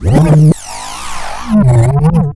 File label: backscratch, scratches; Zero-G; Freak Beats